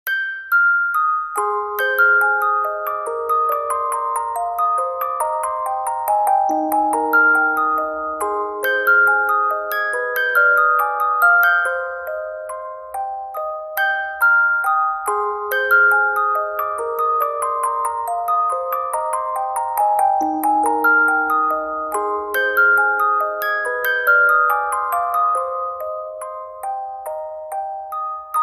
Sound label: Music Box; BNHA